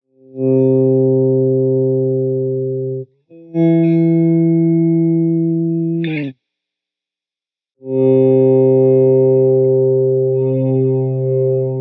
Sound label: Ambient Long Delay